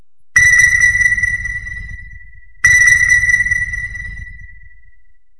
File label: sonar